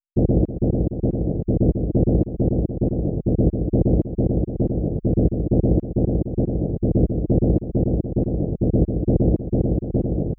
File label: Clock Gear; Clock Tower 20th Anniversary Sound Collection